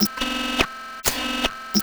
CYBER DOOR, CYBER TOYS; Spectrasonic Distorted Reality 1

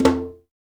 PRC CNGA, AFRICAN PERC; Roland LA

